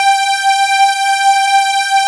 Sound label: SAW SQU G4; S-LEAD; MKS; AKAI S-Series CD-ROM Sound Library VOL-8